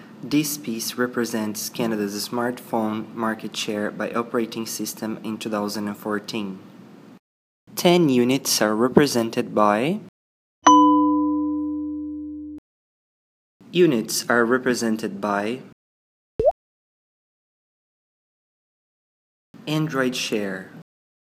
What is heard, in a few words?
Sonification